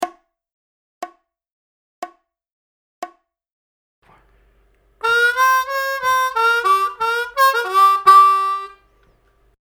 Intermediate Licks in G 60BPM, harmonica

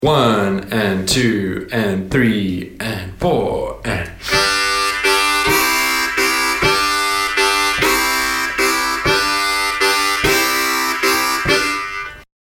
Solo Rhythmic Harmonica Playing

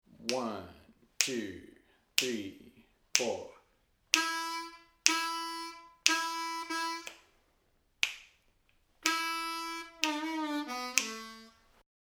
Lick Slow, harmonica

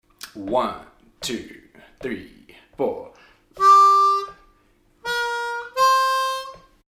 Bass Line Lick, harmonica

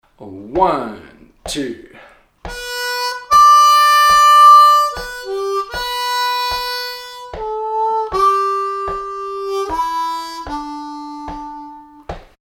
Lower Octave Lick slow, harmonica

